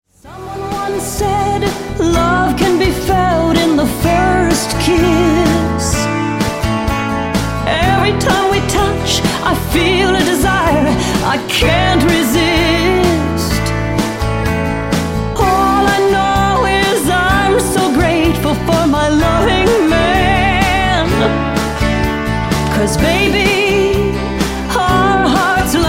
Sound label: Country Singer